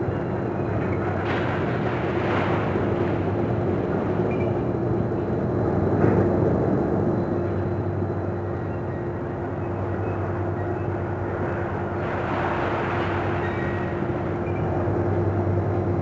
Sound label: crane loop; World of Tanks Blitz; ambient milbase